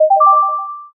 success

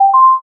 m notify

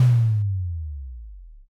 impact don

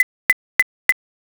thinking time

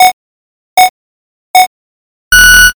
countdown

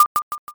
rotate

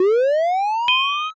charging

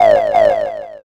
crow

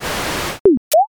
gacha roll

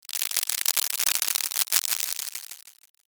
applause

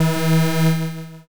wrong buzzer

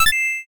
notify follow

